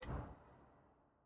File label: trans generic section record return click